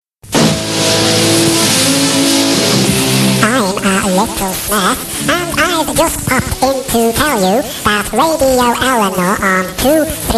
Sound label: Jingles